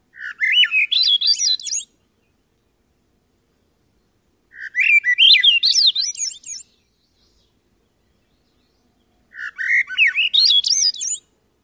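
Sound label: Swainson's thrush song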